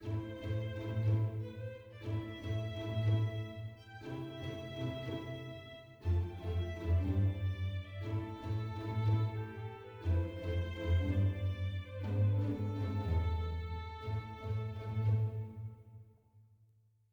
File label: Heroic Soft; atmasphere windows gamerip 2018